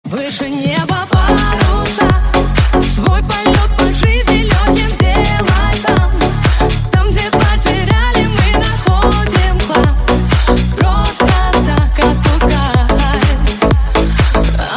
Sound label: sax remix